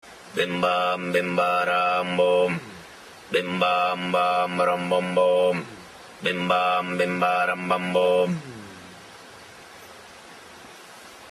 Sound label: BASSU; mialinu pira